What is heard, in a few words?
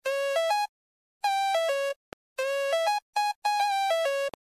8Bit; Spidey